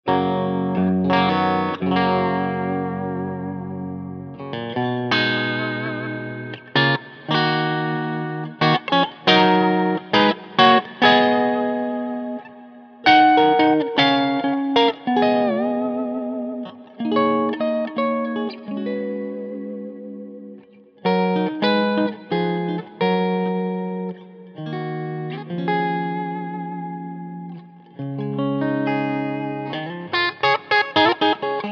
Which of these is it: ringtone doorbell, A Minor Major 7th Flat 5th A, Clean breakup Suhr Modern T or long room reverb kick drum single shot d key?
Clean breakup Suhr Modern T